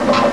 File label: machine; Sound Library 2000; Wayzata Technology